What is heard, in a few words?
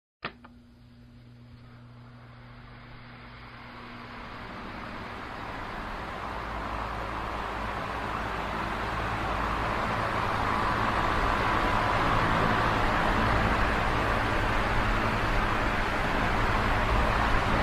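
switching on and fan speeding up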